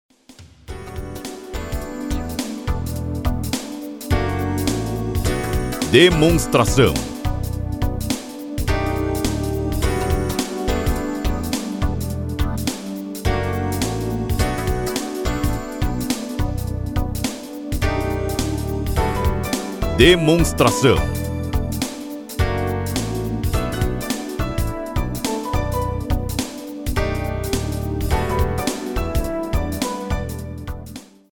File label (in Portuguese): Trilha